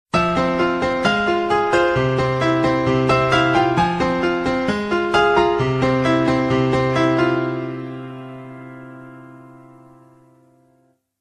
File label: galaxy dusk